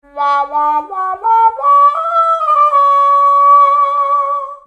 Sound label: Trombone